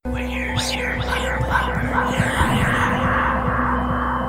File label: bomb lobber